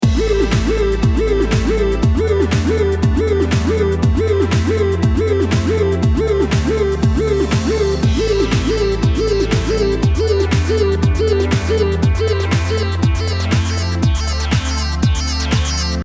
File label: Olstinato Full 8 Bars